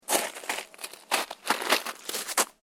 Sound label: SNOW SCRAPE